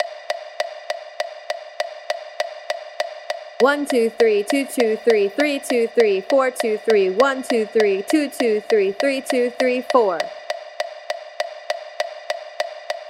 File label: FAST; 12/8 Example 1 Fast